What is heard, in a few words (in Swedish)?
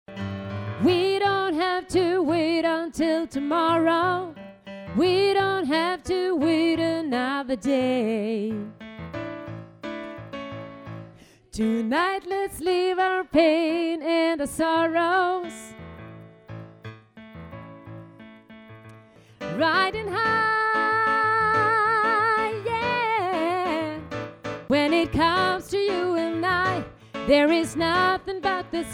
sopran